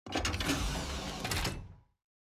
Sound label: Lobby Exit Door Open; starbites